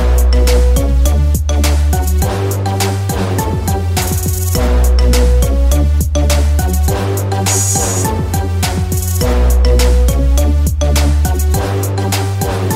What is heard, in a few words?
Marimba Remix